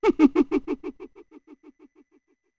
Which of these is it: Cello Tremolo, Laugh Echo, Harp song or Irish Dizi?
Laugh Echo